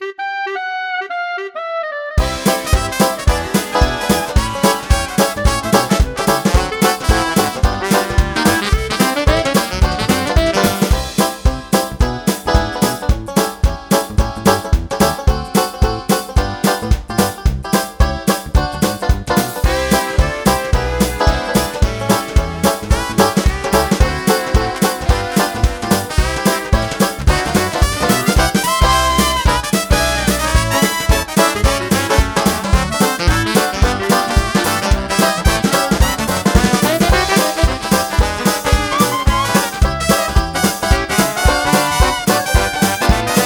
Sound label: Banjo Dixi